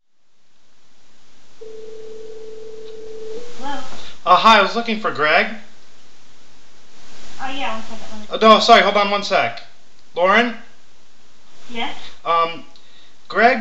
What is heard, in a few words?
phone